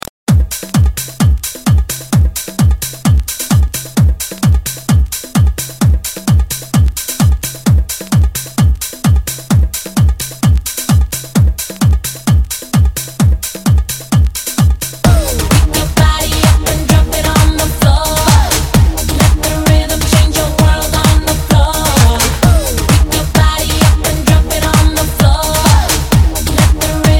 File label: Dj Intro Latino Hook Cut Redrum